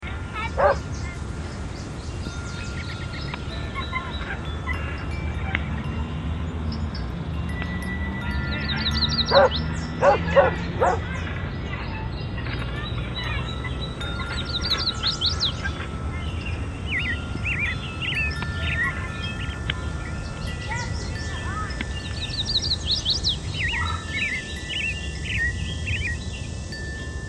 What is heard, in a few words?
Summer Loop; Memory Stairs